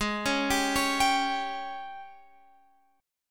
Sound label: G sharp Major 7th Suspended 4th, Guitar, Open F; G#M7sus4 Chord